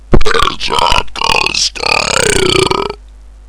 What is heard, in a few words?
belch hardcore style